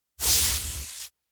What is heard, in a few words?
white light short searing bursts rumble hiss